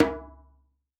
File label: Djembe - C#; Cymatics; Zeus Percussion Collection